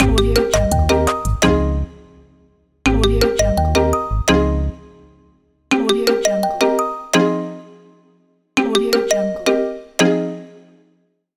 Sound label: bright logo